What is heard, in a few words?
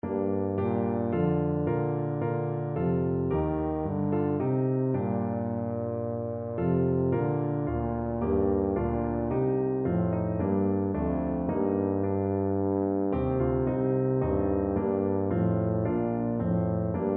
emmanuel, tuba